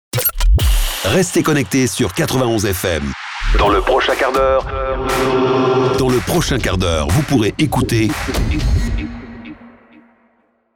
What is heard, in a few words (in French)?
Jingle Lancement Hook